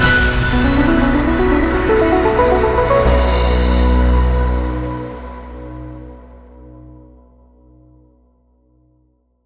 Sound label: bigWin End; elements